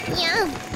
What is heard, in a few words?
noise